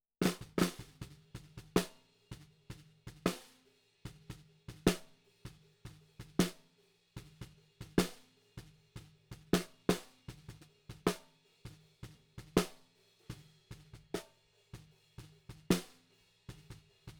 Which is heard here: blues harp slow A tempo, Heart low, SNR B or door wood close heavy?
SNR B